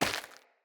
Grunt Step Default, footsteps; Enemy; Characters; Corrupted Sproutfolk

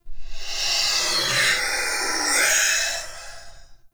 Binaural Whispers; Ambience; Warframe; Shadowgrapher

